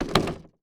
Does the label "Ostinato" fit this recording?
No